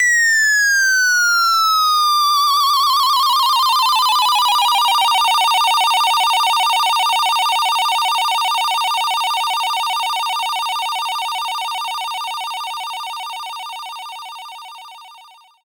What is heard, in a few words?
win; Ssb4; Pacman